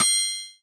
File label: Metronome Bell; Zoom RT-123